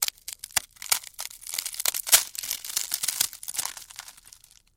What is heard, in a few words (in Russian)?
zvuki vaflei